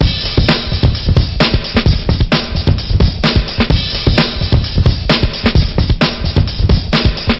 crash my brains out, drum loops and breaks, 130 bpm